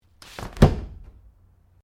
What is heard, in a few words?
Umbrella Open